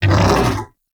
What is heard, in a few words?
Vocal Death; Hunting Critters; Undazoa